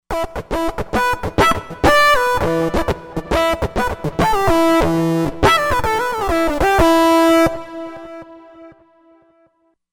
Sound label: Body Electric, Roland Fantom XR